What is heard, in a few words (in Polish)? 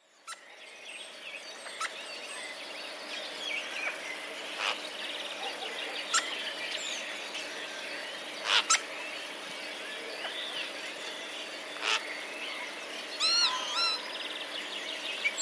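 szpaki, tym nagraniu szpaki poszczekują, naśladują kawki i wydają odgłosy przypominające wiosenne głosy sójek; wroblowe; zapozyczenia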